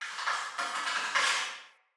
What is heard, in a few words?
Cave Ocean Creature Close